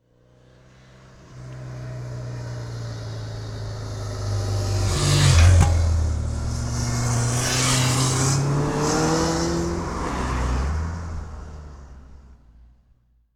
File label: motorcycle pass by